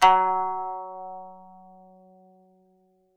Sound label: KANUN F#; SoundBytes; Early Patches; KAN SING BIG